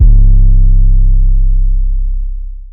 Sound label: Not Nice 808's